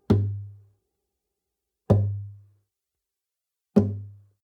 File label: nylon guitar drum single tonal bass